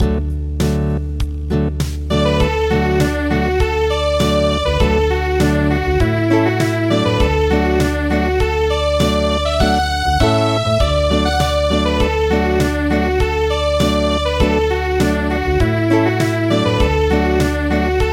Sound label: Acoustic, traditional